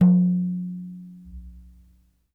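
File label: Small Frame Drum Medium; Sony CS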